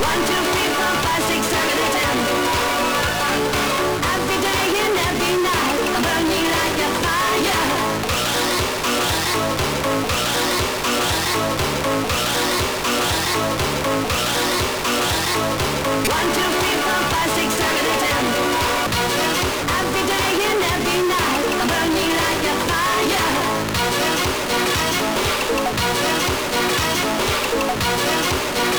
sid, MOS6581R3

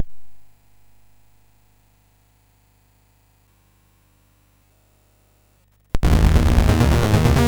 Moog Swing, MOS6581R4

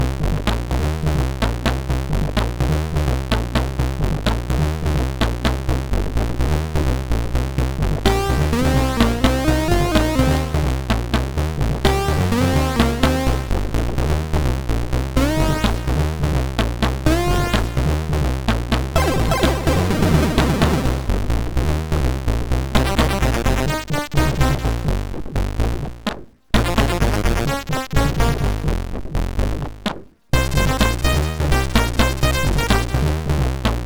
Short n Scratchy, sid MOS6581R4; Deek

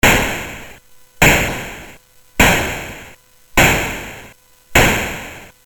Shot, Commodore CSG8580R5; M7 Shot BASIC

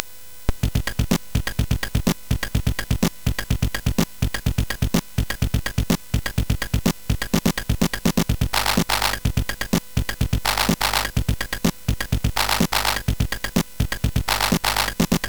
Drummy Beat, CSG8580R5; hvsc

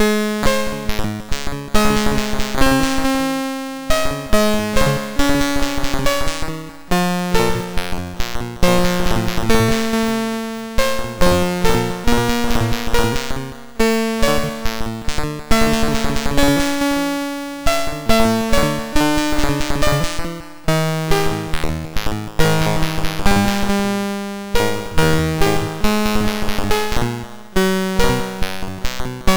Randomly generated music BASIC, sid MOS6581R2

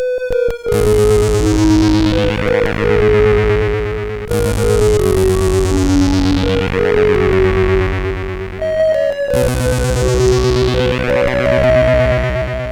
Little Echo, CSG8580; hvsc

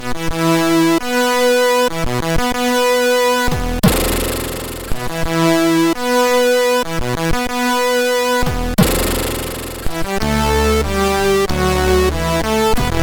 Fanfare, sid, MOS6581R3; Universal Pictures

